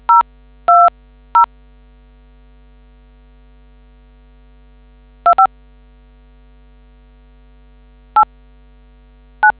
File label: dtmf